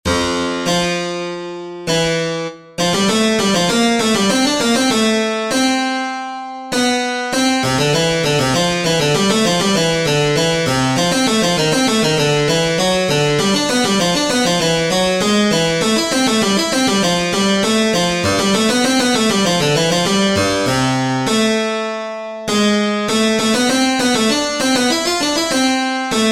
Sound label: Partimento; Fedele Fenarolli